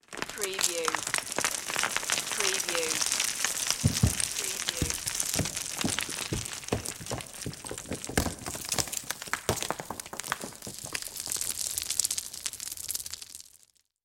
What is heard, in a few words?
Debris Rocks Spill